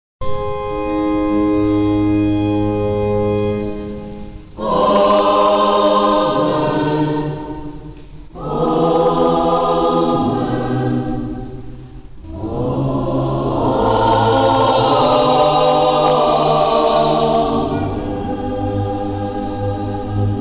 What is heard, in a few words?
Threefold Amen